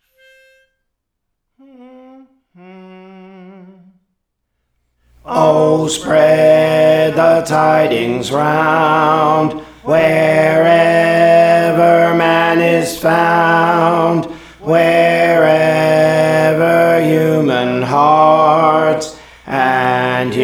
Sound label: Alto, Songs; O Spread the Tidings Round; Praise For The Lord Training